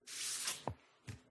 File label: book slide across movement handling; PMSFX